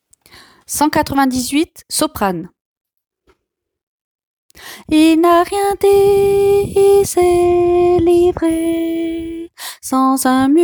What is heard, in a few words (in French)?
soprano